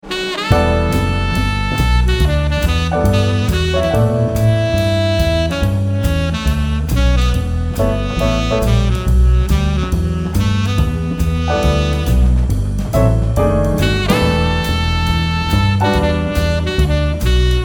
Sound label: beat; moh samples